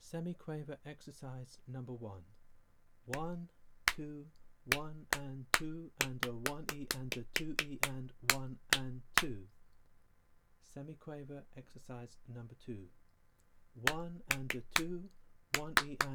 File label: Semiquaver Exercises